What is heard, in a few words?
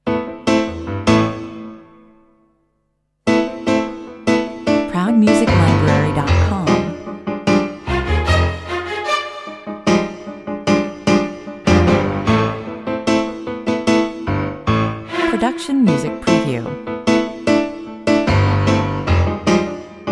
action 150 bpm